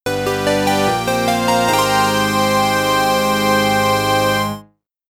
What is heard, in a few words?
Reward [Jingle Event Clear]; Etrian Odyssey